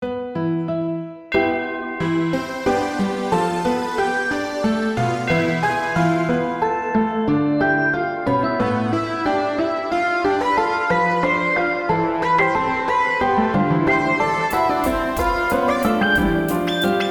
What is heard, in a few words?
early piano mix